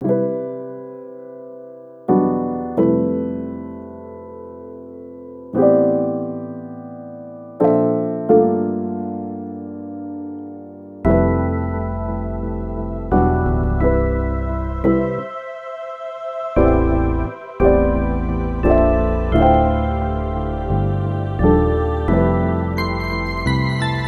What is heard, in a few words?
CITY STREETS, Am, 87 BPM